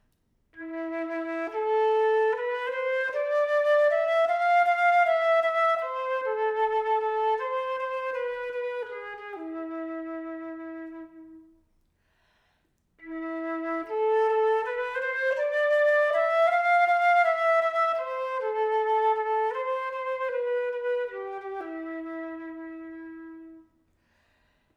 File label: flute cardio